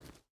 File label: footstep rubber boots forest jump start; Rust